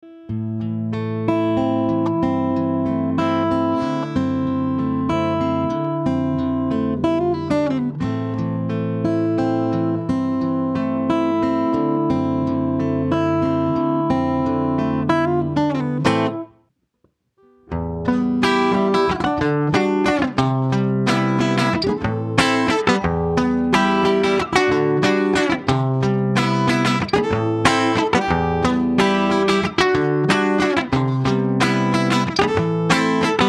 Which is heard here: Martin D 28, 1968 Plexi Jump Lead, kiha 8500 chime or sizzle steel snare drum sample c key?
Martin D 28